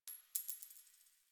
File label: Coin Drop